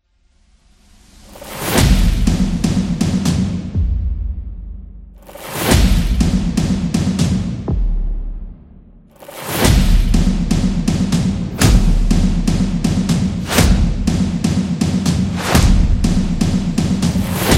The Tribal Epic, epic drums

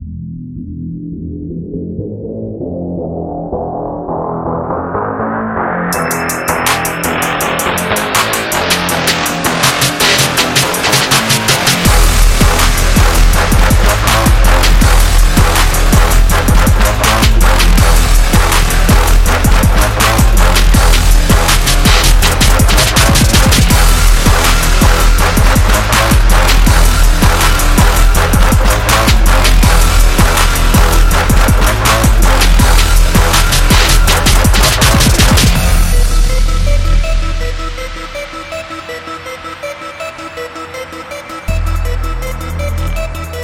This Is Trap

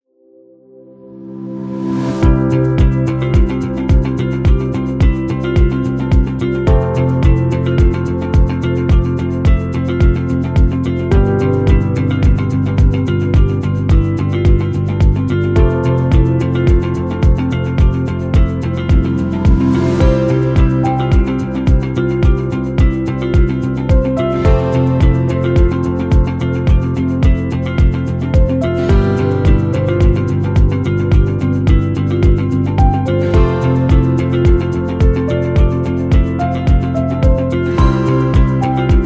Motivational Calm

